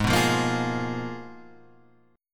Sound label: G sharp Minor 6th Add 9th